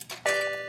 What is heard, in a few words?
BUTTON CLICK; mscasino